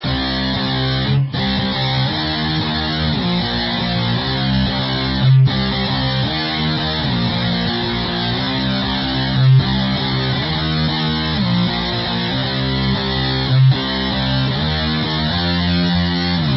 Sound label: electric guitar, shifting